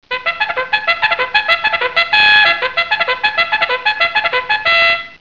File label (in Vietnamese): Tieng thoi ken trumpet vui ve